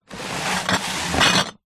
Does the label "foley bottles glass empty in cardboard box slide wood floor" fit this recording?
Yes